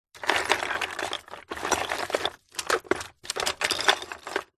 drawer wooden full of loose bits and pieces rummage